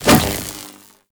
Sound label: Prod Whoosh; Warframe; The New War; Drifter